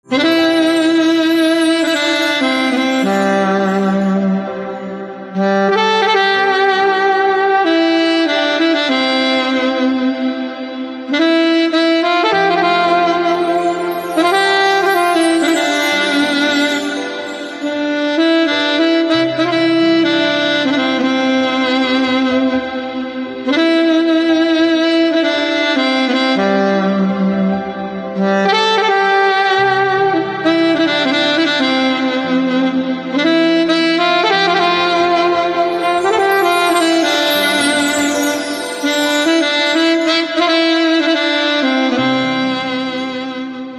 Saxophone

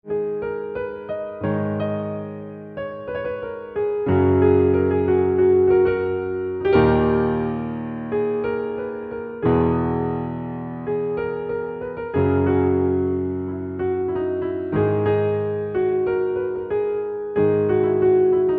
Piano Silent

